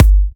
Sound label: G, Kicks; markus hakala